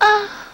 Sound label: "oh" girl sigh; ooh ahh